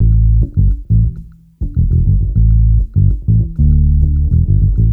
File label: Bass Loop, Ambient Grooves